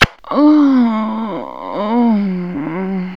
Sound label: SEXVOX; X-Static Goldmine 1